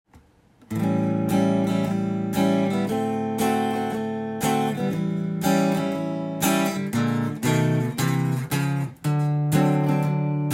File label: a blues croma, guitar